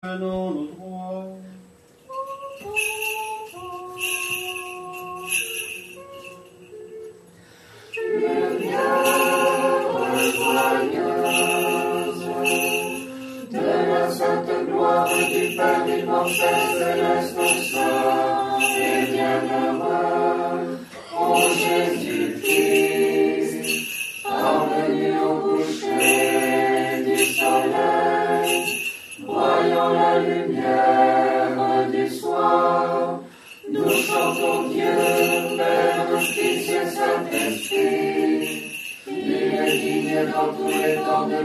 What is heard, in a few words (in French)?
Lumiere joyeuse, Vigile orthodoxe